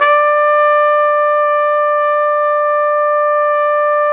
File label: Flugelhorn; Roland L-CDX-03 Disk 2